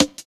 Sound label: Skippy Cut, Drum Loops, Jungle; Zero-G Jungle Warfare 1, 2 & 3